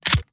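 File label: shotgun rechamber; reloads; iw8; romeo870; gmod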